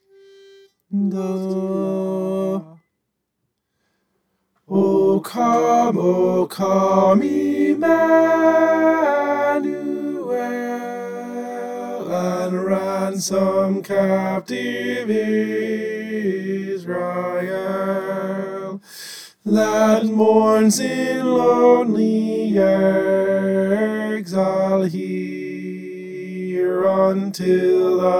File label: tenor